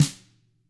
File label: HIT, TAMA SNRD, Drums; ILIO; Double Platinum Drums 2